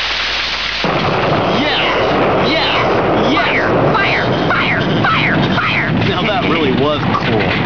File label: fire; "Yes, Yes, fire, fire, fire, Now that was really cool"; Beavis